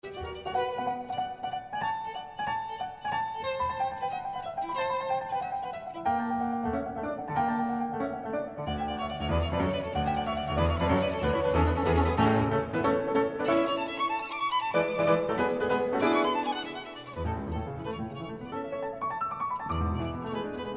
Violin Sonata in A Major, Kreutzer III. Finale Presto